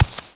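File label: walk right medium, FOOT; The Elder Scrolls III Morrowind GOTY; XBOX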